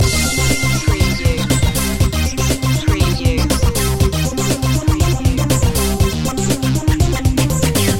retro electro loop

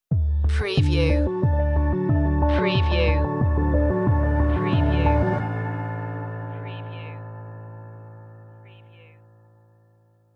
corporate sooth stinger

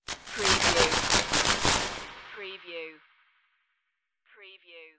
electric space glitch

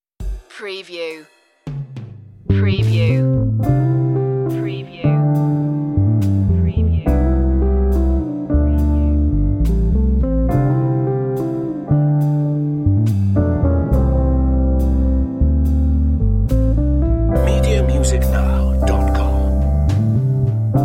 jazzy love

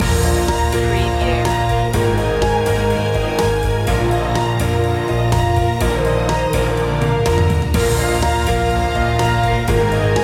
daytime stroll loop end

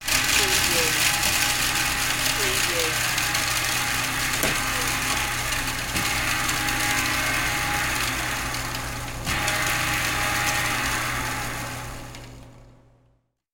Food Processor

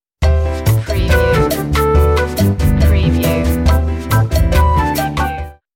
breezy stinger

